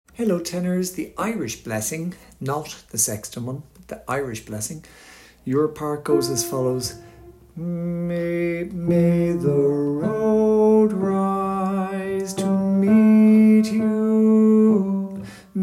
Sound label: Tenor